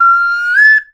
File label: bird calls, percussion